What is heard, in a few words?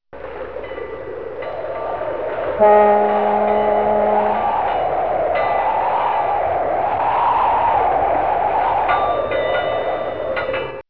harbor windy